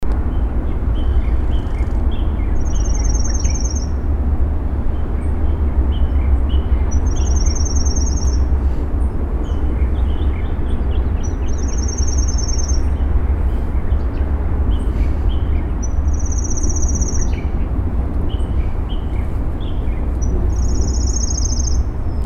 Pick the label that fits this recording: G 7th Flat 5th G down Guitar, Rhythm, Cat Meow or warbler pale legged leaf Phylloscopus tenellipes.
warbler pale legged leaf Phylloscopus tenellipes